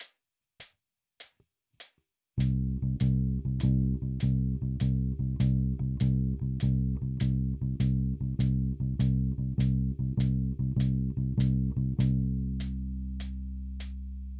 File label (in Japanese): ハネる